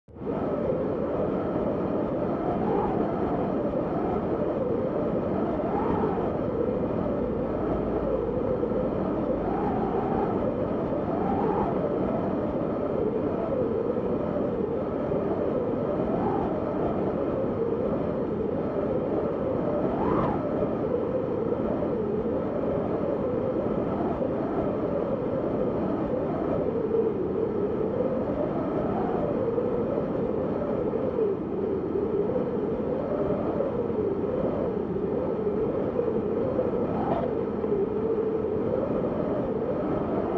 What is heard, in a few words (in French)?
bruit du vent long